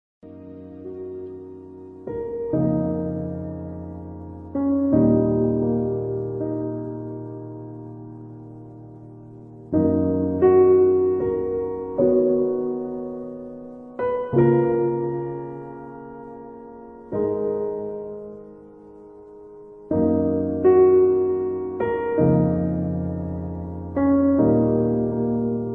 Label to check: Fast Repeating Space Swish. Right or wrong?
Wrong